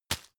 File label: tissue grab hard; objekte; taschentuch